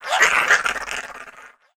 Imp Death

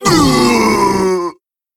Male Ogre, Death

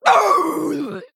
Female Fel Orc Death

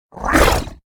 Obsidian Statue Attack

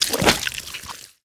Tentacle Attack

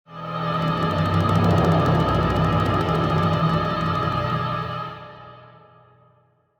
Play Stinger; Vashj Prime

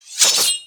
Glaive Medium Underlay Attack

